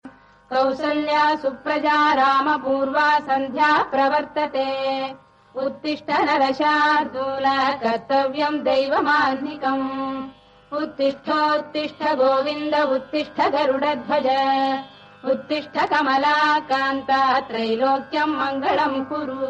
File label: suprabhatam devotional tamil song